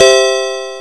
Petit Beep; Bip Boing & autres

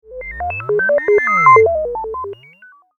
clic; MOBICLIC161

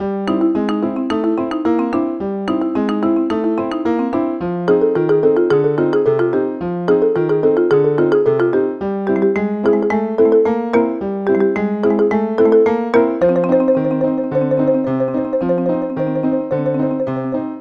MUSIK DANS

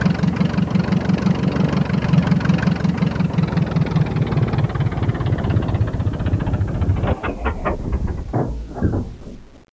bateau arrive; Arc En Ciel Et Le Lagon Merveilleux

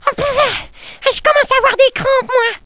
bruit; MOBICLIC37

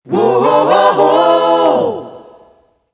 Choeur; Mobiclic51

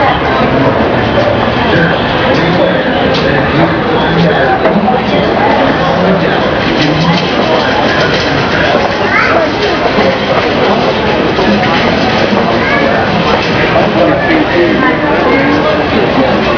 bruit de magasin, foules; pinnacle; french

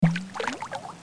plouf

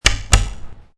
miaou

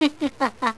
RIRE

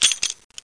tour; MOBICLIC 13

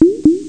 echec; MOBICLIC41

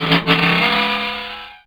Avion; alone in the dark 4

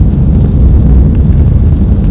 vaisseau; Big bang

